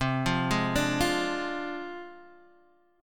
D Minor 7th Flat 5th C, guitar chord

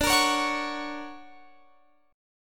D Augmented Major 7th Guitar Open F, chord; x,21,22,20,21,20; strummed; JGuitar

